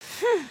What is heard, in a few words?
sigh; emotes